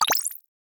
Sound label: decision, button